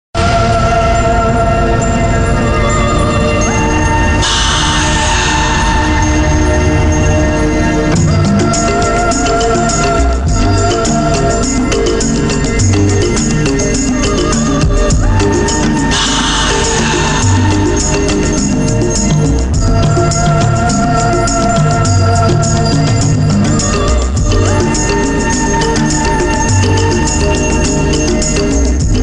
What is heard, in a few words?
Background Theme